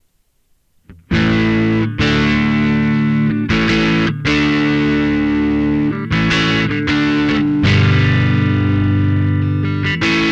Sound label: sansamp gt2 crunch